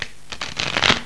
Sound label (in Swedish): Blanda; Solitaire House